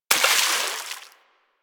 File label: Bullet Impact Debris Water